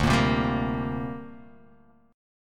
D 7th Suspended 4th Sharp 5th, down

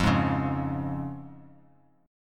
F Minor Sharp 5th F down; Fm#5 strummed; JGuitar

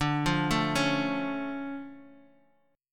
D Minor Major 7th D Guitar; 9,8,9,6,x,x; DmM7 arpeggiated

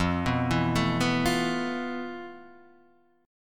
F Major 7th Flat 5th Guitar DADGAD; down; chord shape

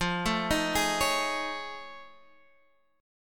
F 7th Sharp 5th Sharp 9th F; arpeggiated; chord; JGuitar

